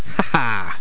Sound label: haha